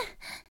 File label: Moan